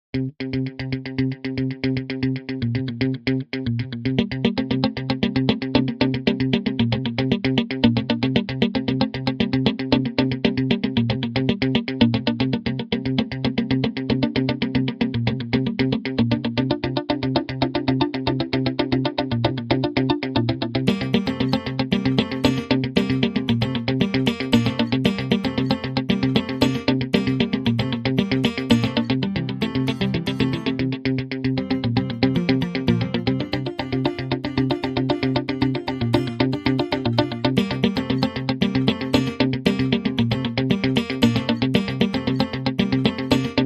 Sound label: Pink Guitar